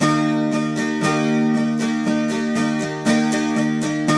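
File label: 12 string major E3, Guitars; Spectrasonics; Hans Zimmer Guitars VOL-1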